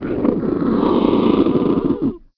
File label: zombie poison idle